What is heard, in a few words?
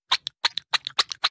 maggie simpson noise